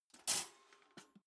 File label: toaster pull in soft